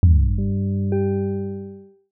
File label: logo documentary mark